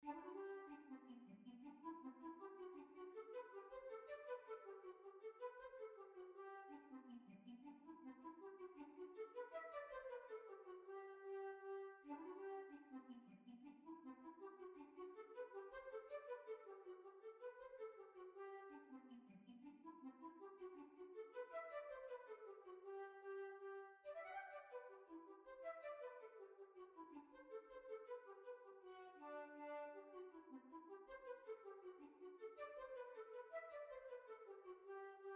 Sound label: Hornpipe Morpeth Rant